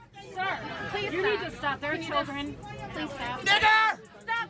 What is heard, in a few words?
man scream; burger king; in a plane